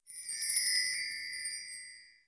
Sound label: shimmer